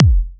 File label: Kick, Kickdrum; Equipped Music; Loungin House